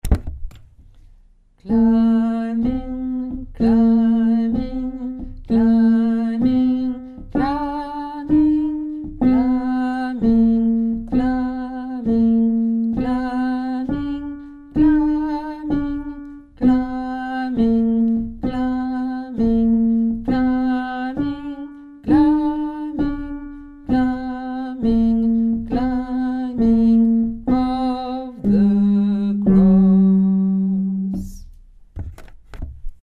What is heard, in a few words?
alto